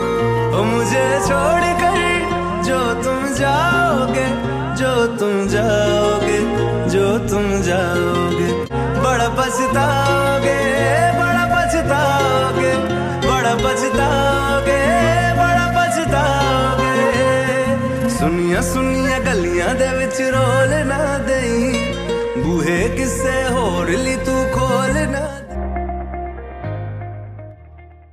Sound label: love status song romantic ringtone